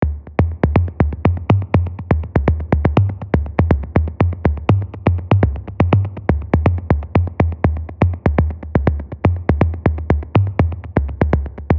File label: 122 BPM Toms, percussion; MMB; Percussion Essentials